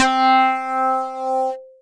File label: Boogie, Synth Keys; Boogie Leader